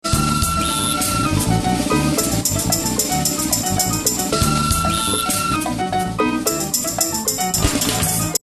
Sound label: Salsa Ring